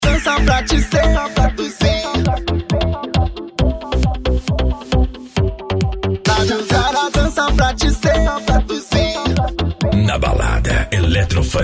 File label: electro funk; na balada